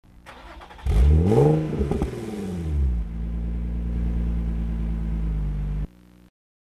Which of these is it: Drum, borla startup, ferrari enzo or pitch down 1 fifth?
borla startup